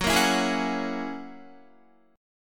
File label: F sharp 9th F sharp Guitar; F#9 Chord; strummed; 13,13,16,13,13,14